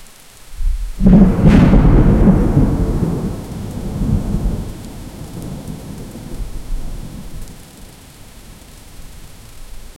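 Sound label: heavy rain and thunderstorm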